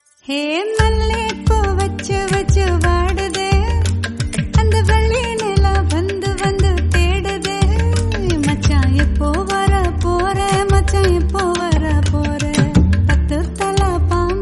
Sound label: Tamil Song; Vendhu Thanindhathu Kaadu